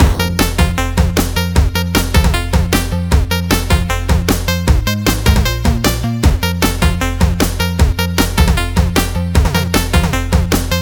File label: Chiptune Stage2; Chiptune Adventures; Potion Party